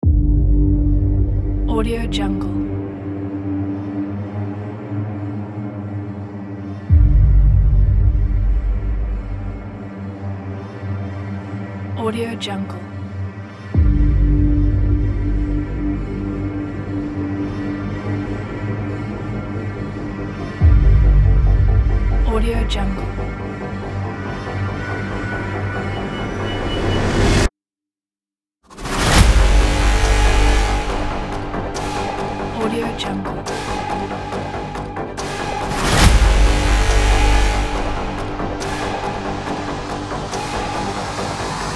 dark intense hybrid cinematic trailer

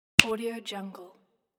finger snap

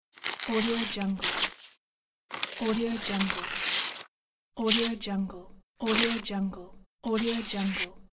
paper ripping

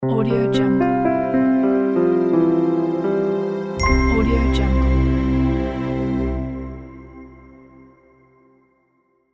classical piano logo

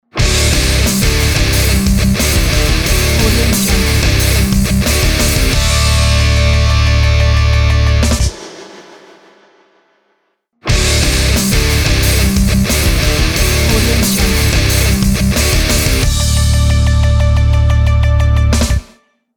rocking logo; mrorangeaudio

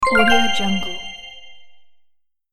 ecommerce basket add

